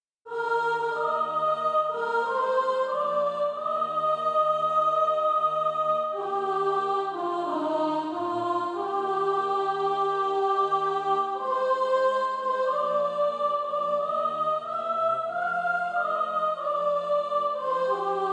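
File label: soprano; Christ the Lord Is King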